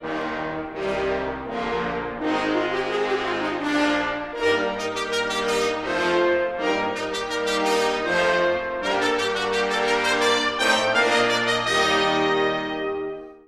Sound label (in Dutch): koperblazers